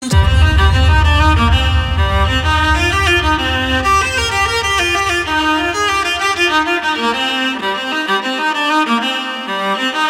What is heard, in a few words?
funk